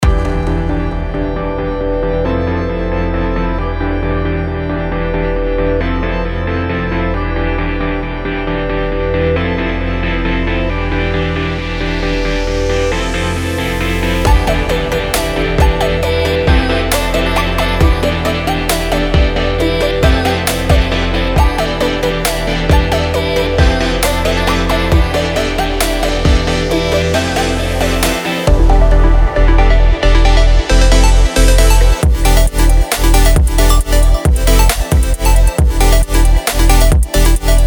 Future Pop

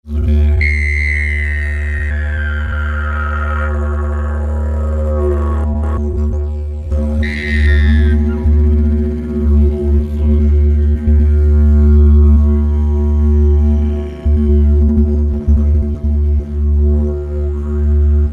Didgeridoo Vibes

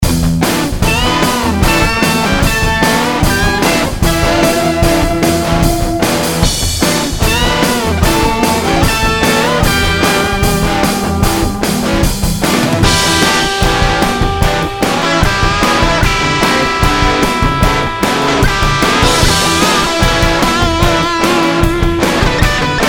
Rockin Guitars

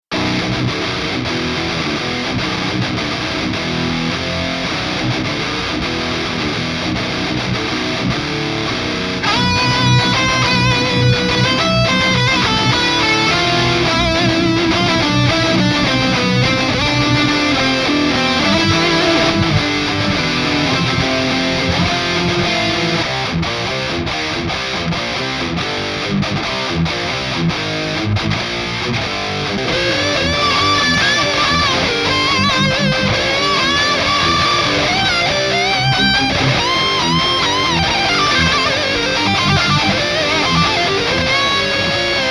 Metal Attack

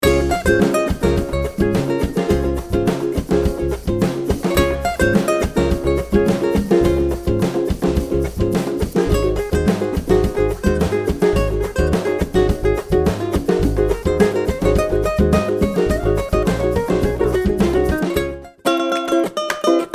Ukulele Loops

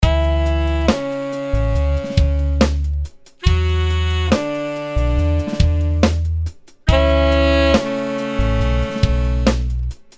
Tripple sax

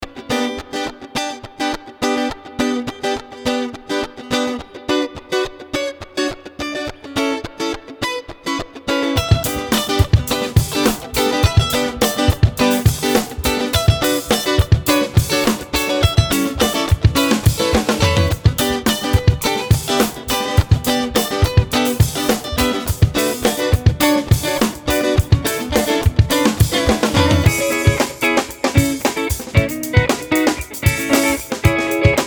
Funky Clean Guitars

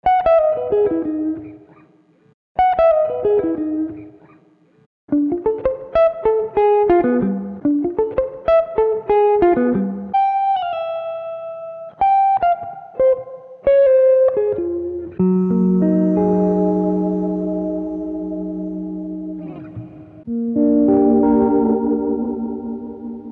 Lo Fi Lush Guitars

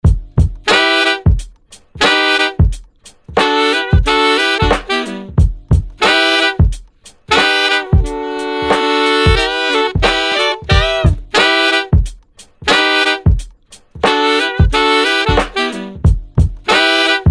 Sax Madness

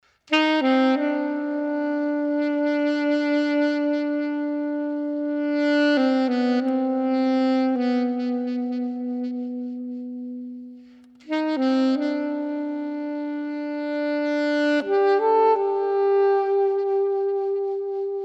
Somber Ensemble Sax solo

